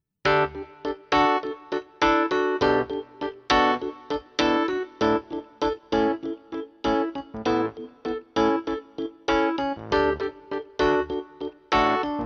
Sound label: Amp Piano